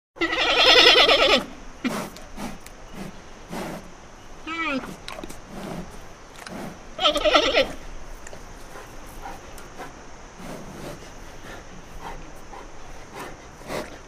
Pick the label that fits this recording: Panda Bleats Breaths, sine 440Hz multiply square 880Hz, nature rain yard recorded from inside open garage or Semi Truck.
Panda Bleats Breaths